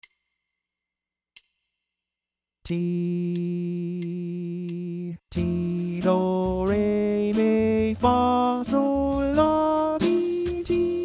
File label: F Altered 90 Bb